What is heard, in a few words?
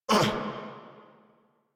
Pain, Grineer Male